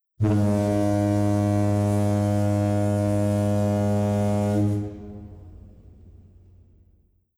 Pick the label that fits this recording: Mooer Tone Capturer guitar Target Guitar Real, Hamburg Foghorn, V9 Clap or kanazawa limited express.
Hamburg Foghorn